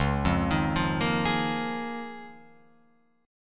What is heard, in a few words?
Db 6th Add 9th Db down Guitar Open F; Db6add9 arpeggiated; JGuitar